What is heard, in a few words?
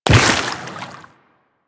Run Water, Footsteps; DE; Warframe; Tenno